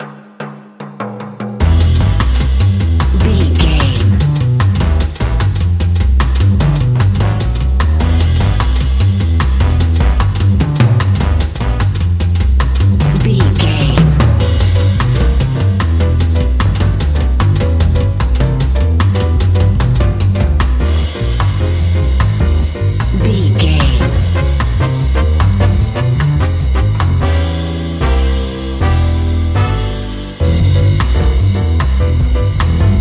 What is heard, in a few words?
uptempo swingers